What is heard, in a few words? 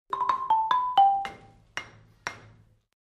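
Marimba Nimble Accent; mallets marimba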